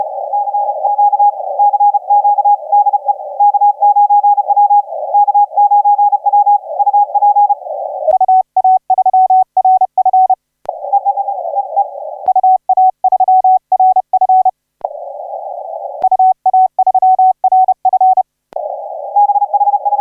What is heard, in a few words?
17m